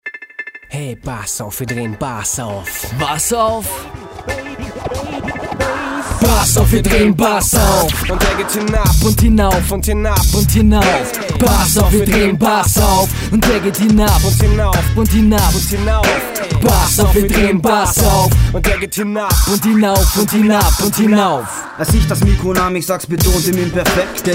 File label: bass auf